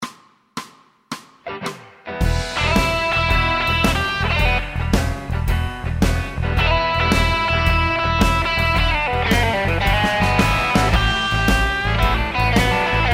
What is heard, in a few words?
Texas Walking Solo